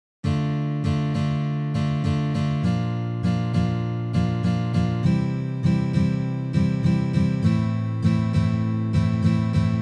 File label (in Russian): boj